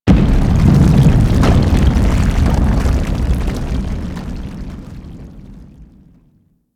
Infested Micro Planet Wyrm Emerge Rumble; Warframe; Microplanet Sun Monster

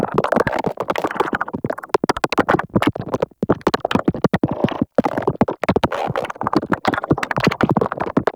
exploding arm click loop; Warframe; Man In The Wall

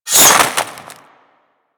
Hammer Success Pull; Warframe; Grineer Hammer Boss; Foley

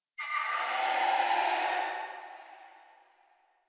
Tree Distant Critters Angry; Ambience; Warframe; Duviri

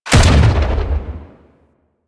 Rifle Shot; DE; Warframe; Weapons; Sentient; Sent Rifle New War

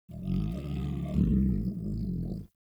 Wolf Idle Grunt; Animals; Vocals

